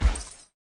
walk

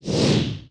Phantom Attack

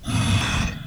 Dark Knight Attack

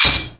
Hit Cristal

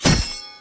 Melee Hit

